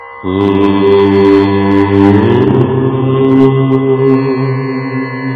Om Mantra

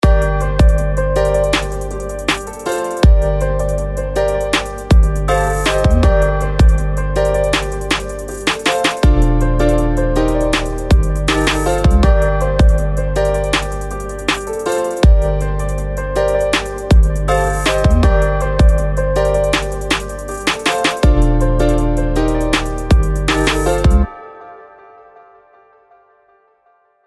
Trap

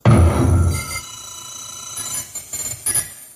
Radar Uplink

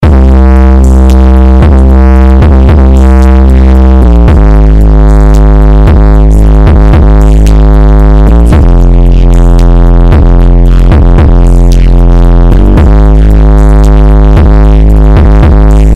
Monster Bass

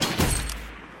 Headshot Ding; Fortnite

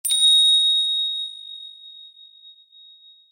Bell; Mitsuha

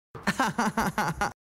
Ahahaha

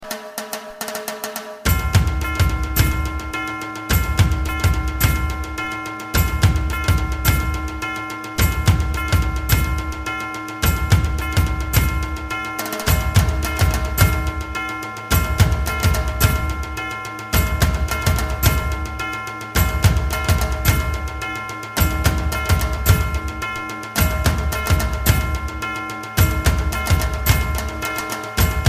Dhol; Nashik

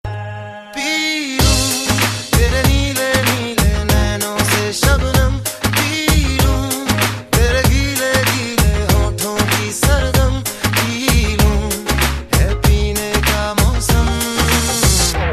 Remix

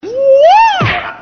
Funny Kick

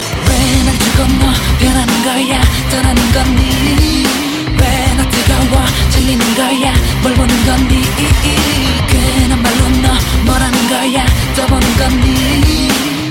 Level Up

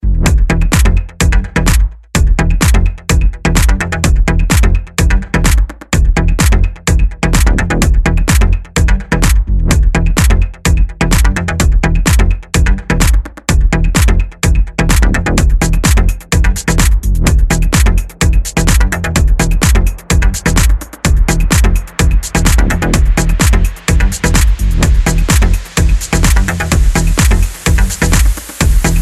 Progressive

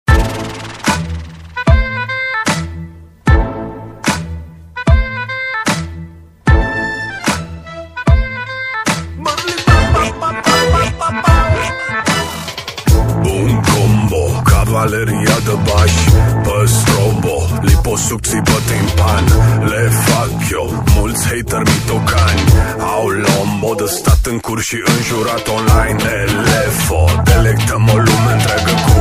La Minor; meloboom

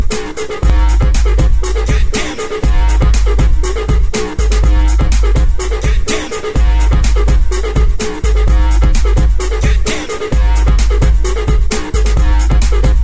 Super Nice Techno

